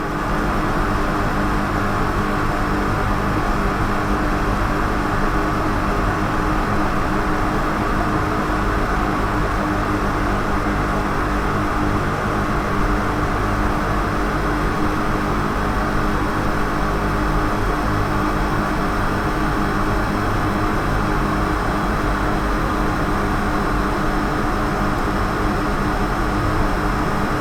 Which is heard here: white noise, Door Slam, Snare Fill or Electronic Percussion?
white noise